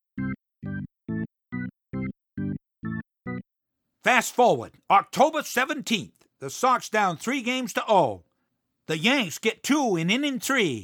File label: Ballad